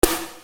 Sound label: Snare Dr